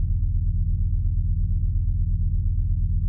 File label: hum loop